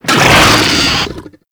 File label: Infested Ancient Attack Grunt; Warframe